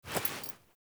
Female Foley Action Default Jump, Default Clothing; Characters; Player